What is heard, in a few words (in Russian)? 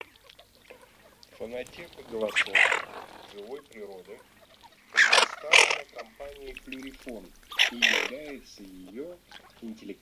Хохлатая чернеть Moretta; plurifon